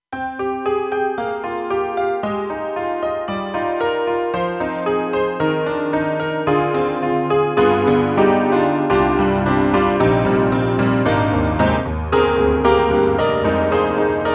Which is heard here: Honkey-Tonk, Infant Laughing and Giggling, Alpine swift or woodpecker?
Honkey-Tonk